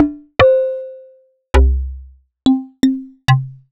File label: Yamaha TX7 TX81Z Tuned Perc